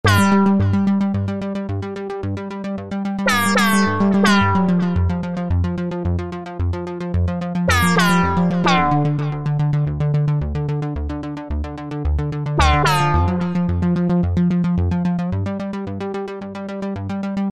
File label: Beat